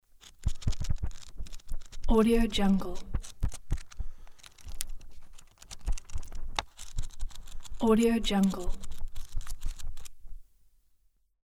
scrolling book pages; audiomonkery

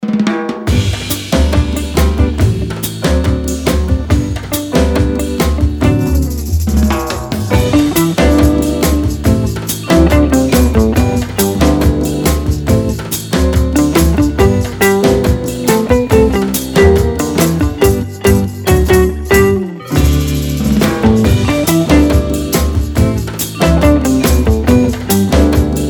little lazy latin

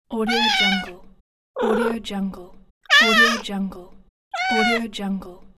meow

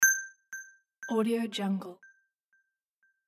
new message cling

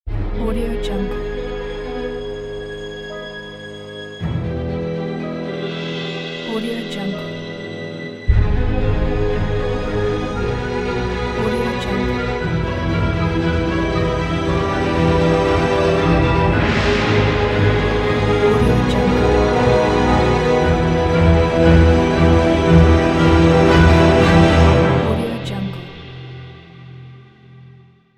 orchestral suspense cue logo

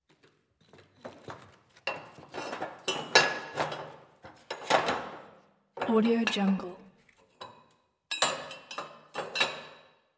searching through metal items in basement

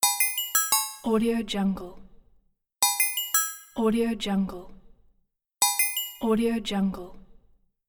metallic message; kaelv

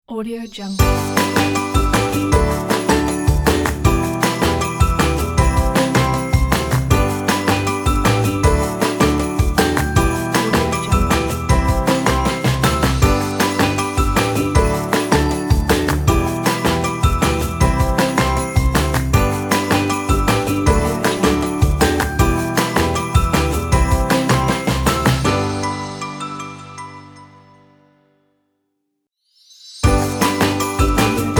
holidays modern kids logo

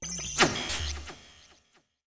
transitional confirms

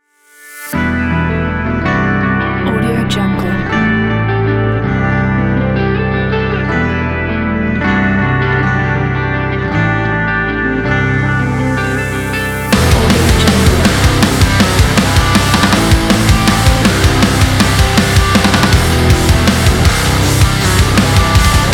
metalcore

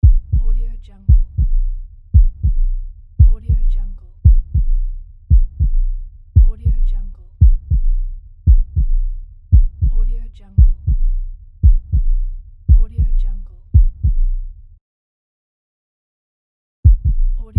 heartbeat

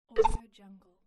alien button

